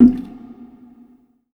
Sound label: PERC, Hip Hop R n B; USB Soundscan vol.19; AKAI; HHFX 89